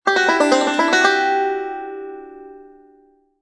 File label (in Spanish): banjo comedia